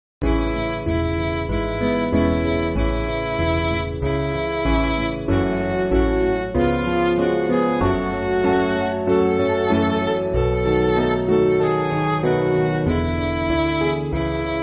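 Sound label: Band, Backing Files for Churches; Now The Day; Merrial; 6/A Chord Sheet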